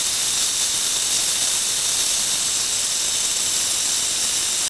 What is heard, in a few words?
Pipe Whistling; ambient; pipes